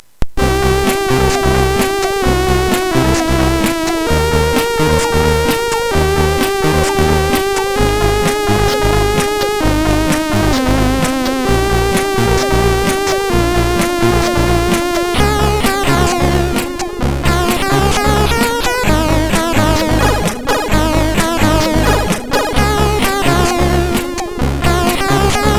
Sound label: sid, MOS6581R4